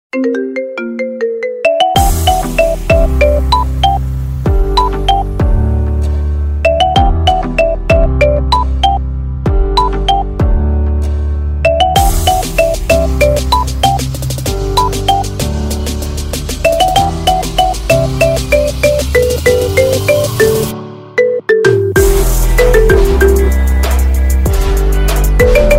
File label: Marimba Ringtone